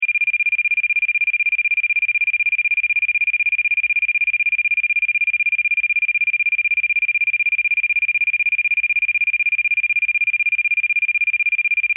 Telephone Unused Warning, Phone Line Open Idle Warning Tone Loop; Telephones; Electronics